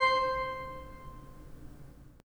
pipe organ, 3-D Audio

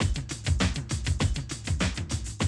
drum loop, 100 BPM

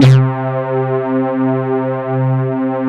DS8 C 3, Korg; Back In Time Records; Korg Universe VOL-1

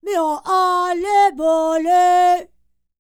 Vocal Planet World Voices, NOMADIC

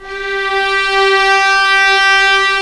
viola G3; AKAI S-Series CD-ROM Sound Library VOL-2

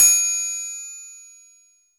Triangle, Percussion; Roland BK-5

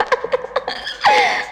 Laugh; Zero-G Jungle Warfare 1, 2 & 3; 90 s Sample CDs

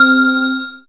synth, c, Top End Tinklers

